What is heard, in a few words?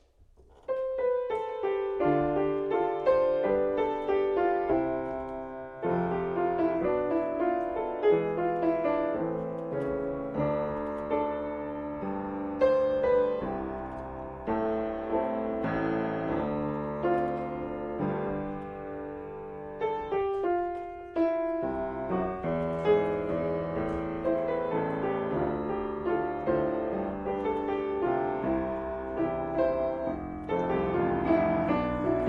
Piano